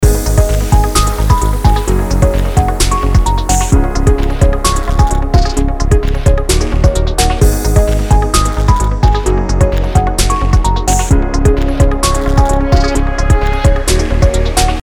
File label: loop